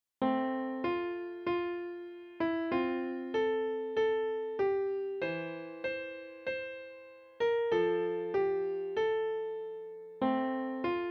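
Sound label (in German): Klavier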